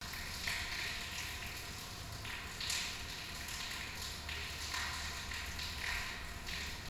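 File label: water flows down a channel stream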